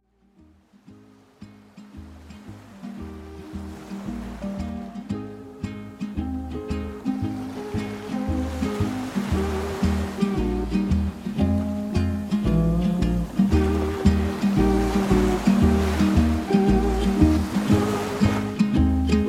shum moria, uspokaivaiushchie zvuki i shum; Shum Moria Kollektsiia

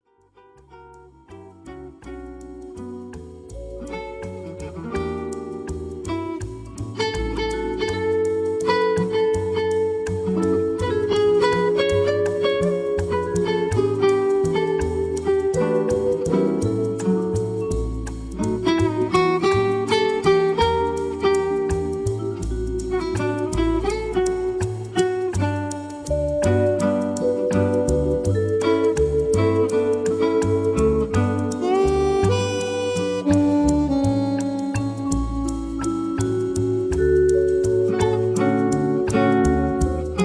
Muzyka Dlia Restoranov Fonovaia Muzyka; Atmosfera Restorana. Muzyka Dlia Restoranov, Kafe

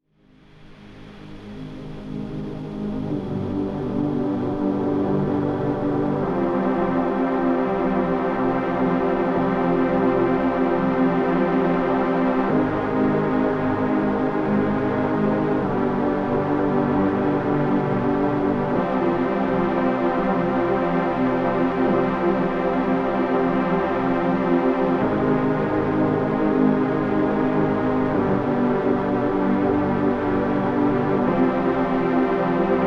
Embient; Muzyka Dlia Sna; Silence

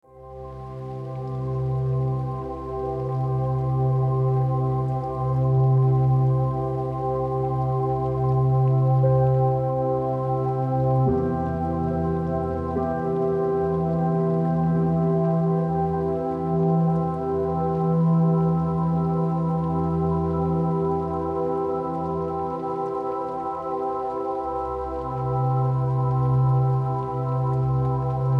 Lullaby, muzyka dlia meditatsii, nezhnye kolybelnye zvuki

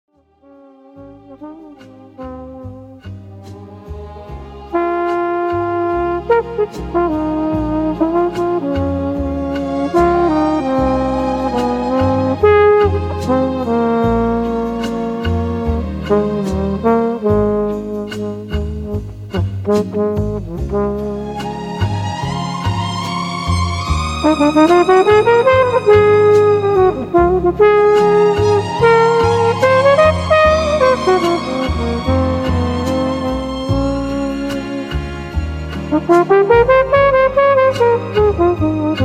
Jazz And Coffee. Dzhazovaia Muzyka; Dzhazovaia Muzyka Dlia Pereryva

